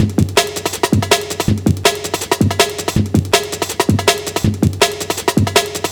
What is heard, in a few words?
Funky, Loops, breaks; m8 breaks